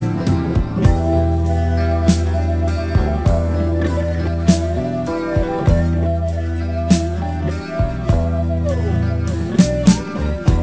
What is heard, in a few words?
recon music